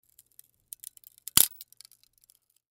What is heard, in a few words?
Crash Glass Stress Break Small Plastic Slow; Blastwave